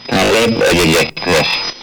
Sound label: scale; Scale avere qua.; Sant'Erasmo